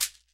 raw shaker a key, Royality free shaker tuned to the A note. Loudest frequency: 7301Hz; percussion